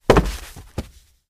Body Falls On Carpet

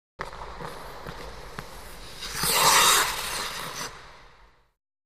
Ice Skating Figure S

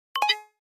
Flash Beep Dbl Guitar

